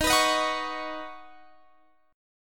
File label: D sharp Minor 7th Sharp 5th, Guitar, Standard; strummed; JGuitar